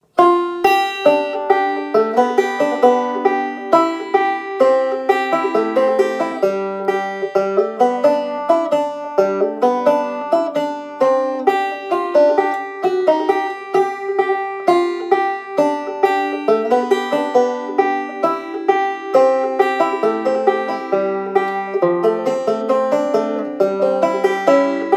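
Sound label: Beaumont Rag, banjo; Anytuned Loop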